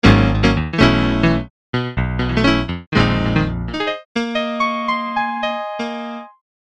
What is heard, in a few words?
electrikeys yamaha cp70 norm